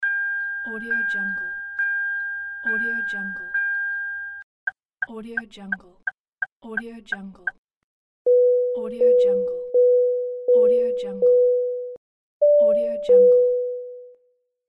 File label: car warning signal pack